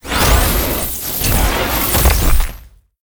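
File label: Mech Gorilla spawn; monster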